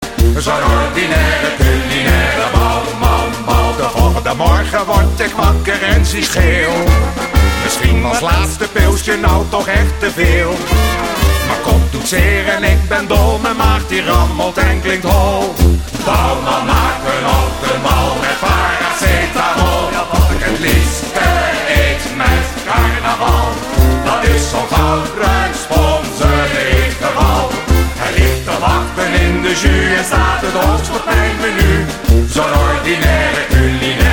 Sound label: ringtone